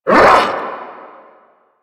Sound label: Vocal Attack Short; Warframe; Duviri; Dax; Vocal Set A